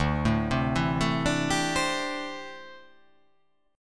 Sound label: D 7th Suspended 2nd Suspended 4th D